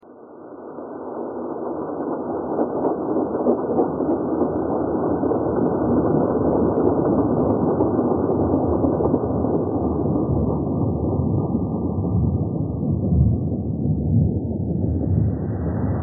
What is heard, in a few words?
train thread all; twisted cords ::: an exercise in chance-infused, text-based, generative composition.